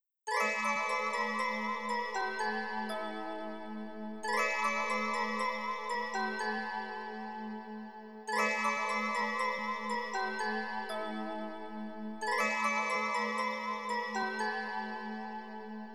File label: fitness music for stretching 432 hz